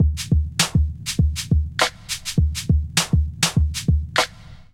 Groove 101bpm, Drums; MIDI Clips; Peace; Ableton; Factory Packs; Chop and Swing

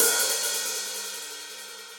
Hihat Open BigPunchy; Drum Hits; Standard Hits; Ableton; Factory Packs; Session Drums Club

